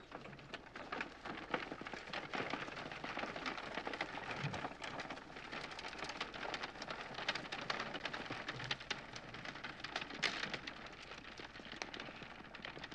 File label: Carriage Constant & Away, Period Vehicles